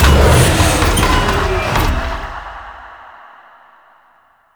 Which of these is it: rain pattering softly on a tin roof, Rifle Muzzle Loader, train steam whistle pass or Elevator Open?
Elevator Open